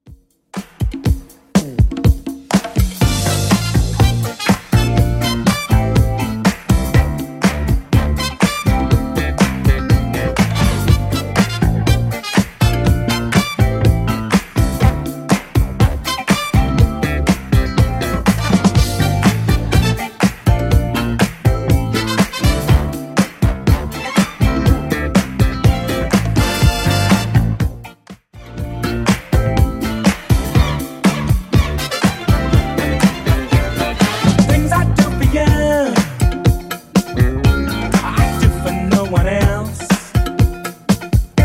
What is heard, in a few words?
70s Redrum 122 bpm